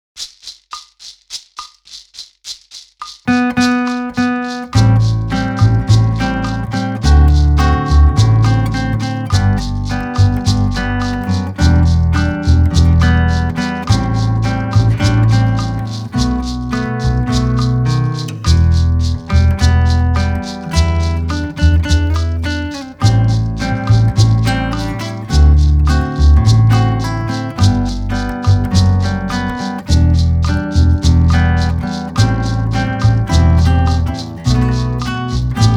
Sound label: Bossa